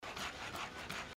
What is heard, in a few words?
walks